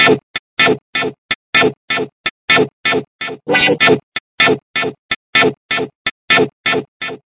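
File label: synth guitar wah stutter, 132 bpm; SYNTH AND ELECTRONIC LOOPS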